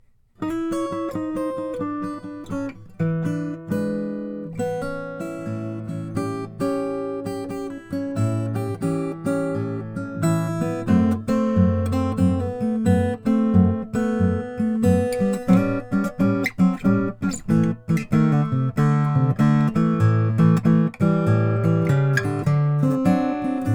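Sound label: c deluxe madagascar rosewood, guitars; froggy bottom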